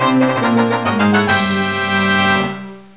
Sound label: koopa win